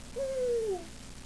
coo

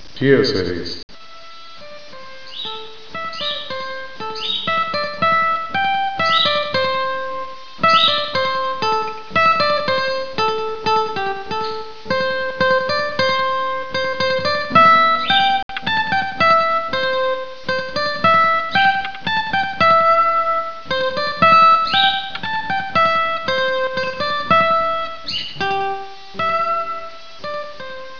guit

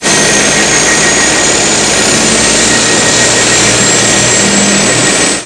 Driller Lo, Sound Effects; The sound of Jose's Drilling Machine; Sound Effects From the Show; created by Merlin the Magician

